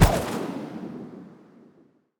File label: enemy death; sfx; dust Cryptid